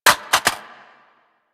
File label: Reload Drop Mag Default, Weapons; Warframe; Boar